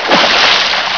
SPLISH

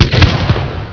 SHOTGUN

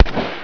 GUNSHOT

